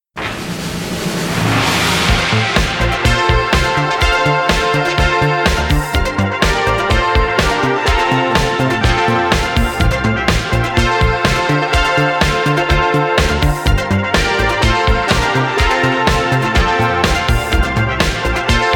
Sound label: Instrumental